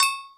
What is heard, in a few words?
FX Sounds, Drum Machines E-MU Pro-Cussion